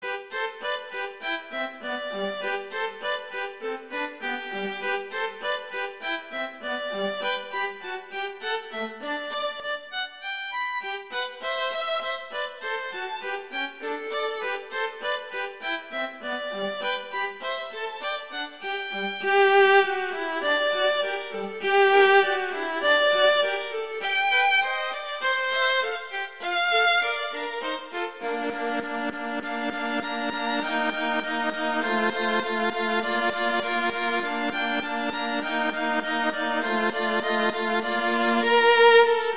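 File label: Duet, Rondo Allegretto, viola; Pleyel; Duets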